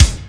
kik, drums; virtual drum sounds; custom drums

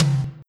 tom; virtual drum machine

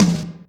tom, drums; virtual drum sounds; custom drums

